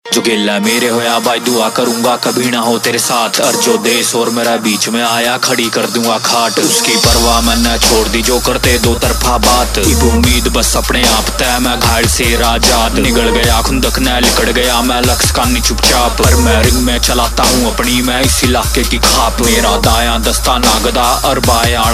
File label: Rap Ringtone, Punjabi